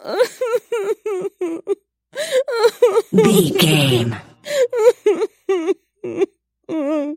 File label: voice female cry